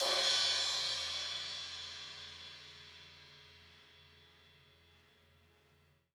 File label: Crash, Drum Samples; Percussion Samples